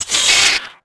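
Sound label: droid spider attack; Star Wars Knights Of The Old Republic II; XBOX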